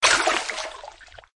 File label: Water Splash